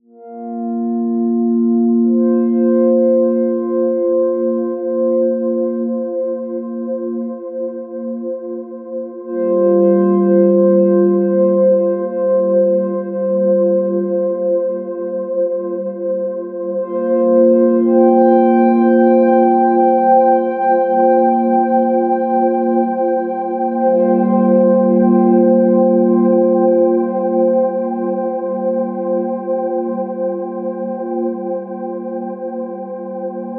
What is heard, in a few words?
Atmosphere; GForce MiniMonsta2; Apollo